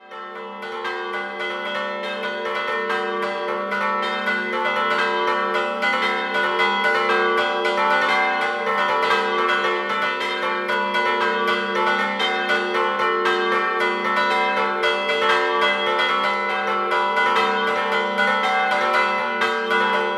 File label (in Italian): Chiasottis Scampanottata, campane